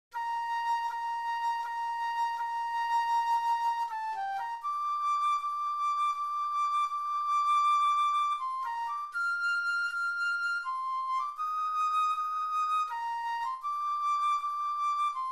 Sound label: flute